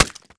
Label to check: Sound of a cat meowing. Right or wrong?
Wrong